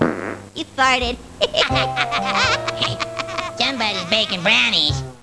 farted

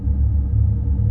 loop intruder lamp short

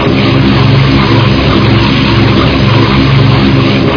main prop

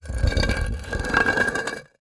rock slide

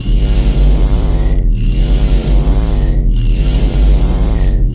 ambience

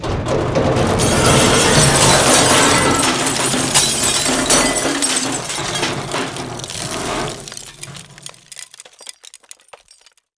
long break

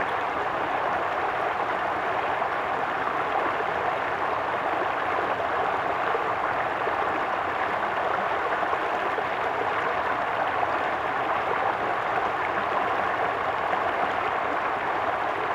ambience water

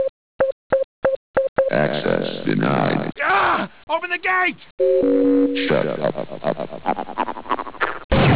acces denied

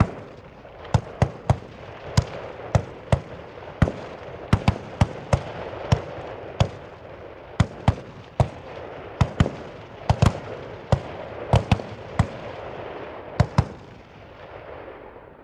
ambience gunfire

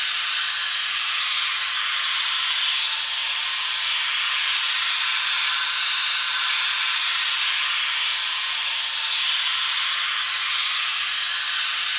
ambience pipe

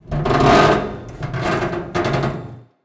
metal creak

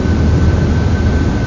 powerhum, ambience

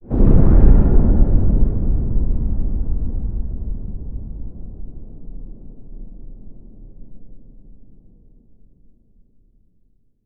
soundscape indoor boom

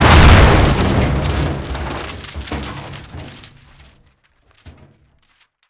boom

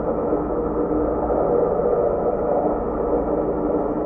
loop launch pad

